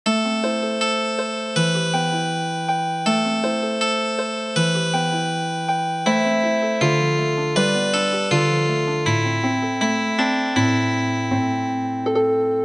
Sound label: Healing Harp, midi; Sakura